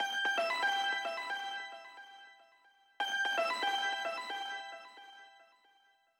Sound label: Env Horn; Splatoon 3; Hiagari